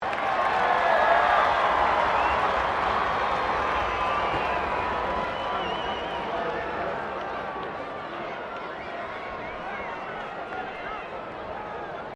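crowd 15K Rugby World Cup cheer low, Rugby world cup game; Crowd Sound Effects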